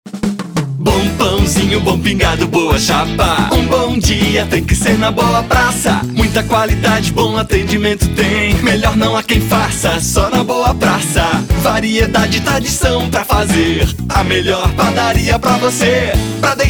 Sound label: jingle